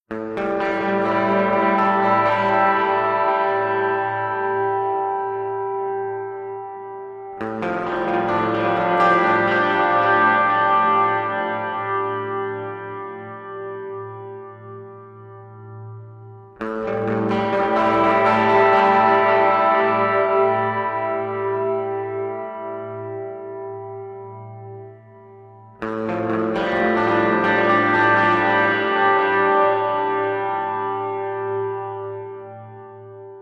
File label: ROCK Guitar